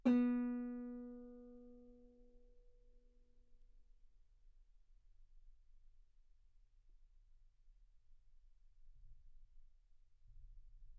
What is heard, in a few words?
Guitar ff B3; sul B